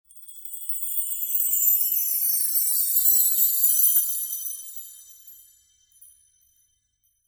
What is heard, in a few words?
Chimes short